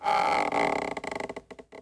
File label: Wood Creak, ambience; Star Wars Obi Wan; XBOX